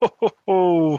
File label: Laugh